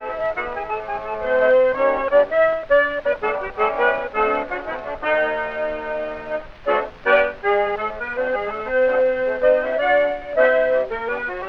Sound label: saxophone with orchestra; variety stage